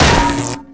neon sign hit world; tf2

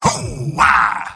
soldier positive vocalization; tf2; mvm

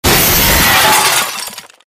shredder death robo; Garry's Mod; tf2

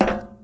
metal, footsteps; Garry's Mod; tf2

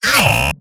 engineer pain sharp; tf2; mvm

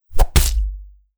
hit, mecha; female mecha titan; lin gang; adanmech

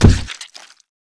body medium impact soft; Garry's Mod; tf2

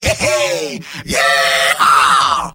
engineer battle cry; tf2; garrysmod; mvm

